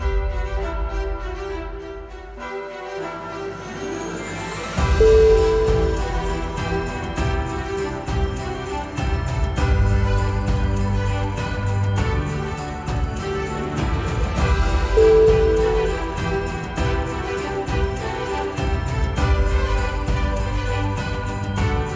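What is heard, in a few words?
carols of bells, music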